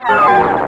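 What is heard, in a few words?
droid assassin; Star Wars Knights Of The Old Republic I; XBOX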